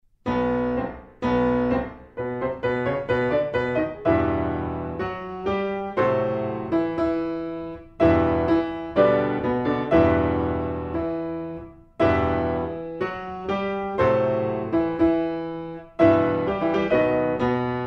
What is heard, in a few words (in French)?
piano seul